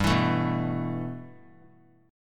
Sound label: G 6th Add 9th; G6add9 strummed